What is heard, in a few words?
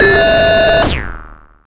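FX right, attacks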